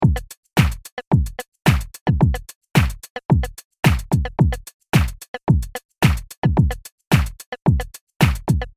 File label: House Kit